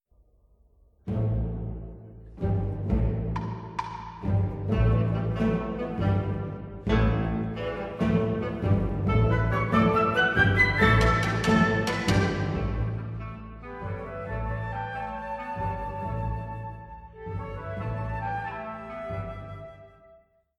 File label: Mixolidio Dance Variations Danzon